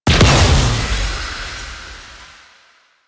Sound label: Void Sink Explosion; Warframe; Void Storm; Railjack